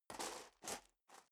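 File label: step dress boots gravel medium scuff, footsteps; schritte; menschen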